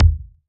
Ball Collision Geo Tackleball Hard

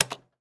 Light Switch; Deathrun

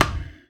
Ball Hit Med Breathy; Spinal Serve

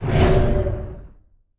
Cutoff, Rocket Broom

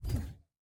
Hand Paddle Release Extra Light

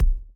Ball Player Hand Hit Tackleball Light

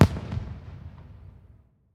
Fireworks

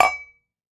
Ball Collision Holiday Mug Hard

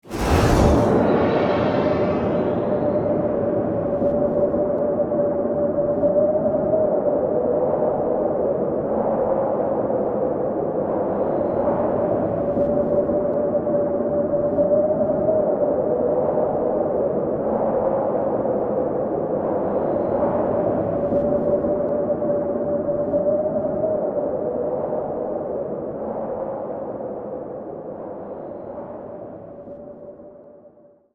Launch Loop, Rocket BasiliskBroom

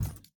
Hand Paddle Attack Extra Light

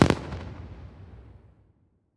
Celebration Fireworks

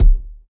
Ball Player Hand Hit Tackleball Med

